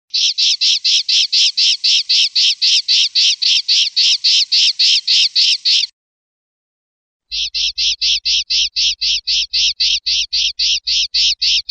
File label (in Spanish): chillonas, pardillo